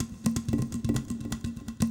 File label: PERCLOOP ETHNO 125